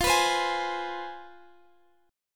C Major 7th Suspended 4th Sharp 5th, F, chord

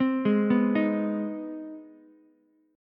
C Minor Major 7th Sharp 5th chord, Ukulele; arpeggiated; JGuitar

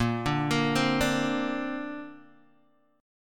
A sharp Major Add 9th, Guitar, Open G

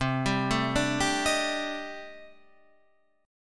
C Minor 6th Add 9th C

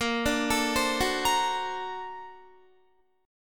Bb Augmented Major 9th Bb down Guitar; Bb+M9 arpeggiated; JGuitar

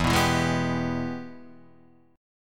Ab Minor Major 7th Sharp 5th, chord

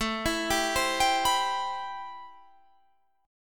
C Major 7th, A, guitar chord; Cmaj7/A; arpeggiated

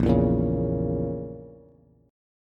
Bb Diminished Bb down Bass; strummed; chord shape

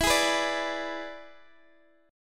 E 7th E down Guitar Open G

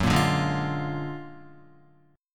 F sharp Augmented Major 9th F sharp Guitar; strummed; JGuitar

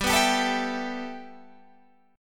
G 6th Add 9th G Guitar Standard, chord; G6add9 strummed

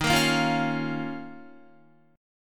D sharp 7th Suspended 2nd, Guitar; D#7sus2 strummed; JGuitar

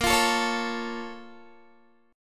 A sharp Minor Major 7th, down, Guitar; A#mM7 strummed

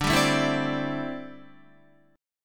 D 9th Suspended 4th D down Guitar; strummed

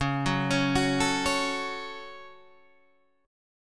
Db Suspended 4th Sharp 5th Guitar Half Step Down

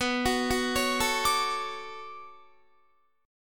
B-Minor 9th B down Guitar; Bm9 arpeggiated

